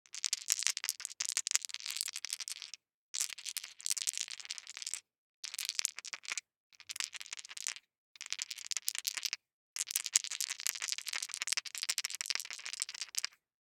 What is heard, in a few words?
DICE in One Hand, Shake, 3 Three Dice; Samples; ArticulatedSounds; DICE Sfx Party (Extended)